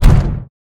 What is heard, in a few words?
Wall thump; Hollow Knight